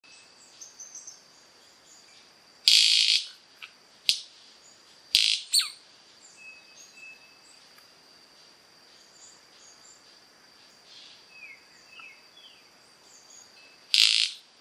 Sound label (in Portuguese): Canto da Rendeira